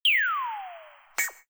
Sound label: Drop Down, notifications; LG SU370 Optimus One